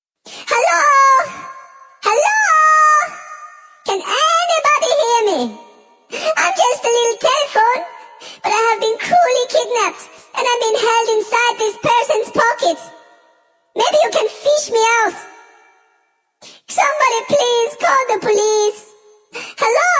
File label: Little Phone